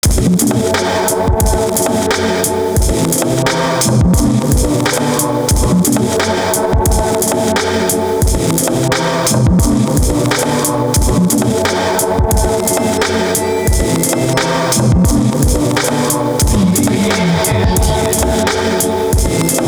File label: Atmosphere